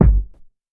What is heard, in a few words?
90s warm sounding rap kick g sharp key, kick drum single hit tuned to the G# note